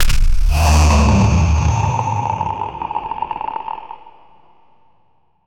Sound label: aggro roar of a heavy, lava